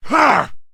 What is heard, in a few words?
man ugh; Mount&Blade Warband